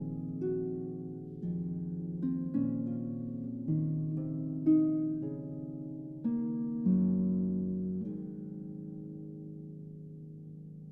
Harp Concerto Lullaby

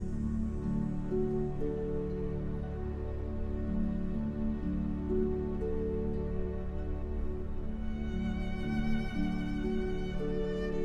Meditation for violin and orchestra; Thais

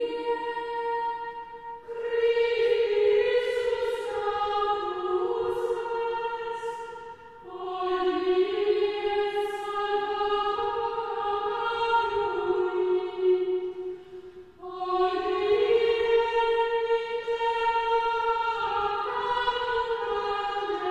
Chant Antiphon; Traditional; Chester Processional

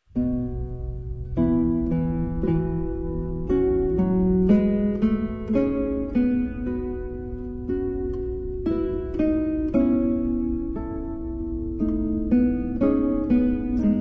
Guitar Quartet

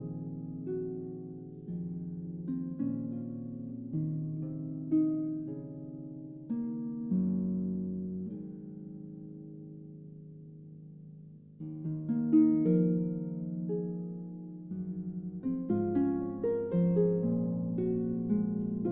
Harp Concerto Lullaby

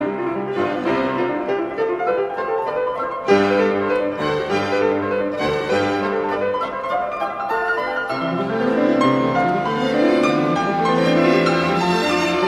Piano Concerto in C; Wolfgang Amadeus Mozart; Piano Concerto #25; K 503

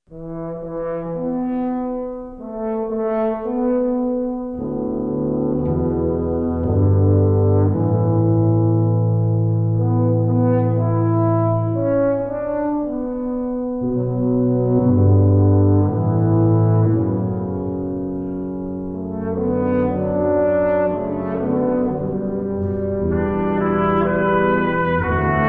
Brass; Personent Hodie; arr. Don Hart

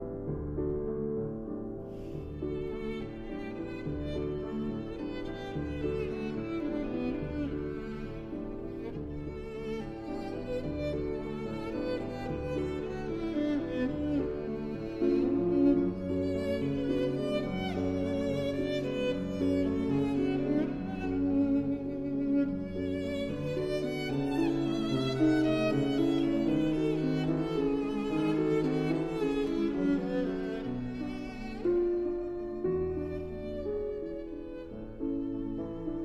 Violin and Piano in D

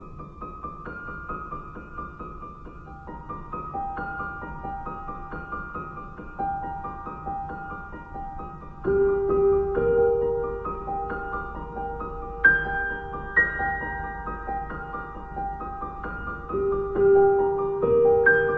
piano duo, Lullaby (Wiegenlied) (Cradle Song); Anderson & Roe

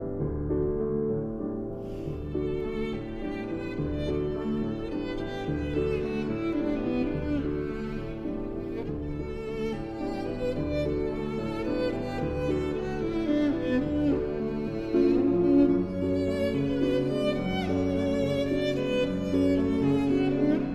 Violin and Piano in D

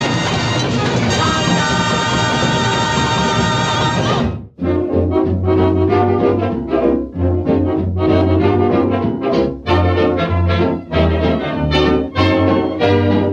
Ork; 1933

